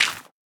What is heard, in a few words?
fill success, block, composter; Minecraft